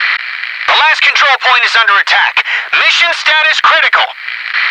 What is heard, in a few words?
noice; Shattered Horizon